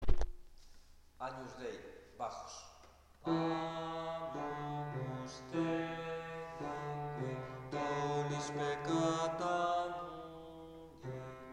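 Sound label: bass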